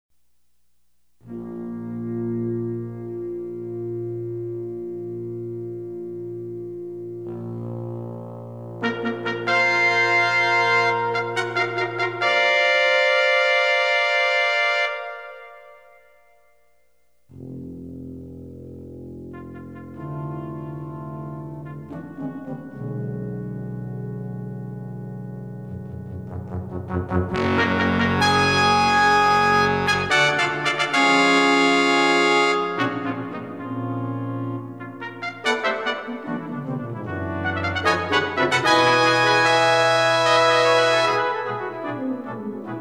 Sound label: Fanfare; Alessi